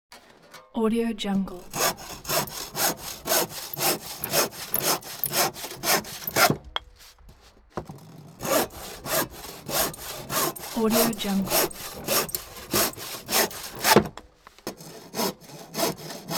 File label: hand saw; urban sounds